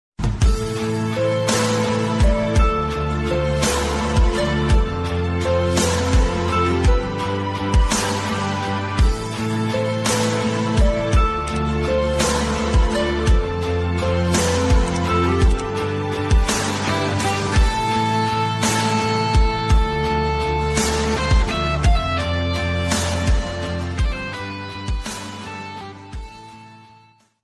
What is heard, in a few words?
Mobile Ringtone